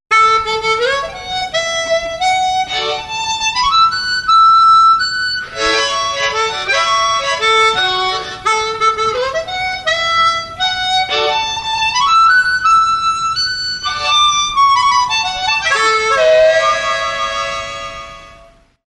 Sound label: short bluegrass, harmonica